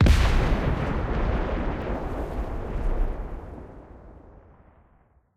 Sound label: miss